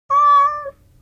cat; WMBonusXP

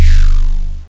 spell; Jailbreak 1.01

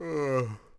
Yawn Vox; Civilization4

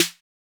808 drum; Mistergenerator

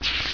SHUFFLE

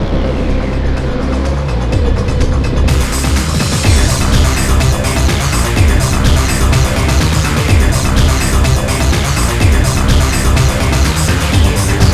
TECHNO

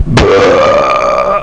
uuuuurrrrrppp

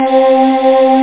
AHH CHOAR; Groovy Bytes: Behind the Moon

